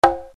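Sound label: Yamaha RX17 conga high open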